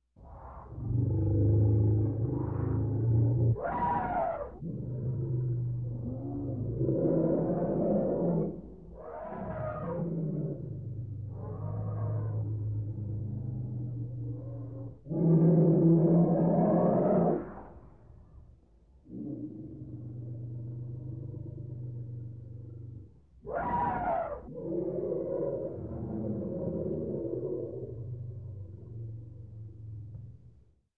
Lion growling elephants in the background

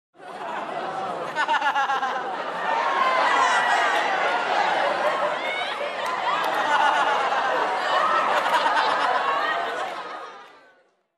Group of teenagers laughing

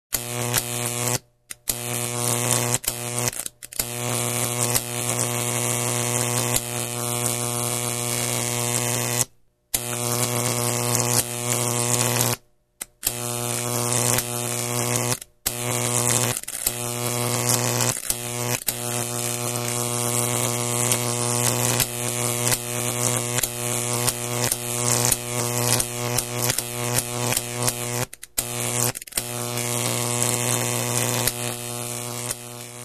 High voltage arc discontinued

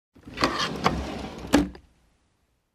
Opening Drawer

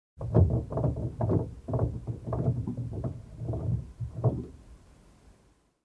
Noises outside the car

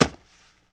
Straight Punch on mitts

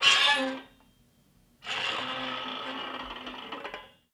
Aluminum Door Screen Creak fast

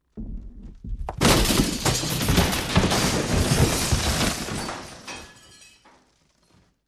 Demolition Sound

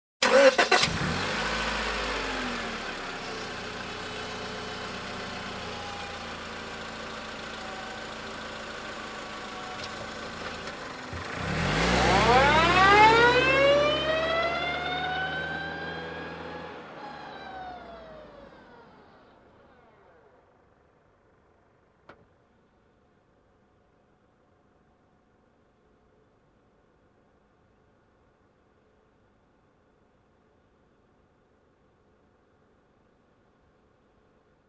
Car engine ignition and reversing

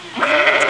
Farm sheep single bleat

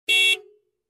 Car Horn once

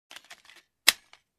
Pistol cocking sound

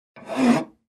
Hand Sawing Wood Single Stroke

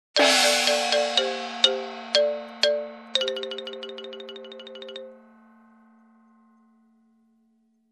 Cymbal crash with Japanese glockenspiel sound

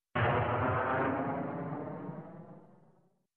Bomb explosion distant

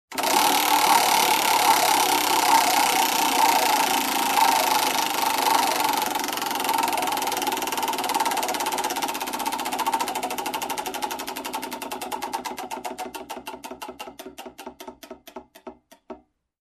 Casino Roulette

